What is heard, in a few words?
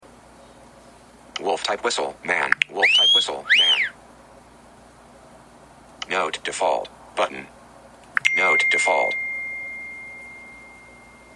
iOS17 text tones